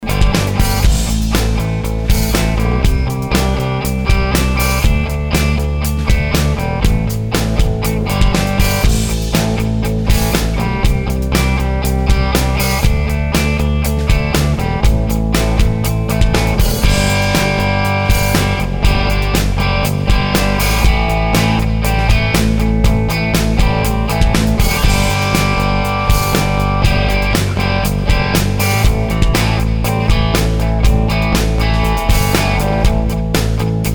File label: thinline rhythym, maple thinline telecaster